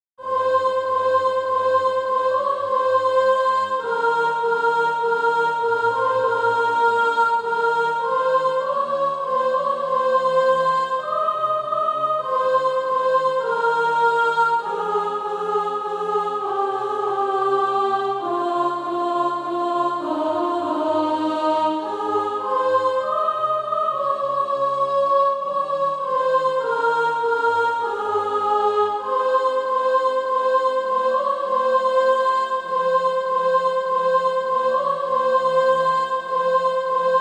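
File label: soprano, SATB Practise Music; Down from Their Home on High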